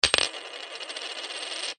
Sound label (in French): son piece monnaie; foundryvtt reve de dragon